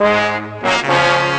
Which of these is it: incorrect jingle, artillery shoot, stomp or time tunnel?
time tunnel